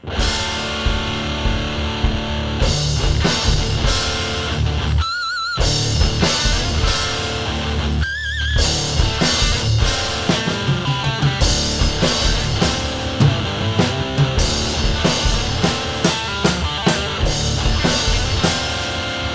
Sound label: Guitar & Drums